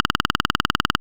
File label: scroll, Interface Sounds; Kenney's Sound Pack